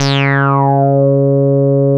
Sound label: C#4, Bass; CRANDLE; E-MU Producer Series Vol. 6 – Analogia Project (CD 1); Boom da Bass 1